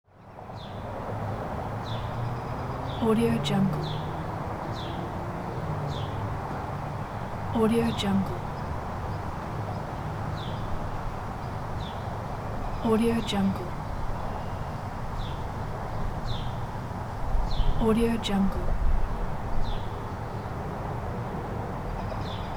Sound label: suburban neighborhood spring ambience